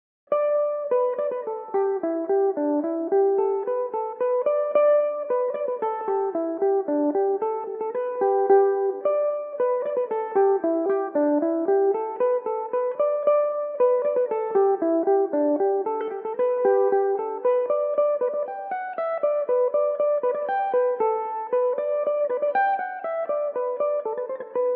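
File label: G major belmuse, irish mandolin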